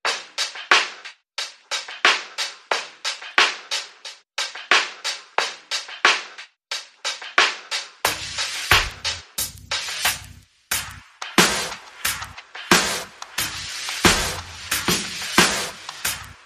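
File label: bass and percussion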